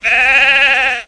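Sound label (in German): Schaf mäht Sound Geräusch; maeh bloek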